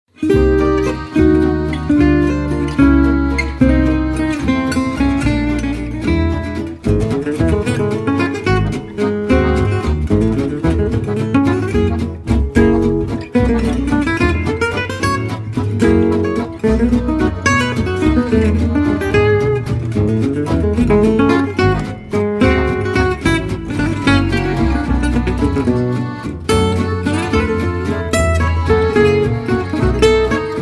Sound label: Waltz